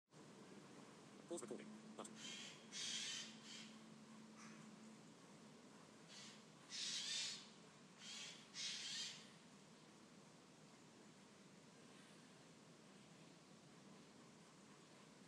strange bird and great sound made by something plastic falling over; in richmond on saturday